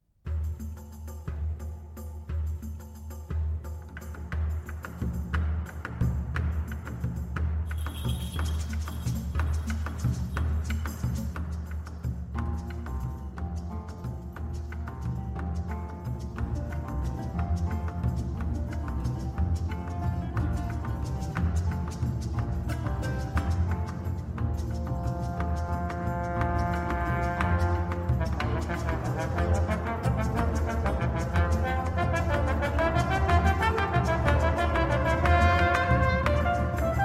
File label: Guaracha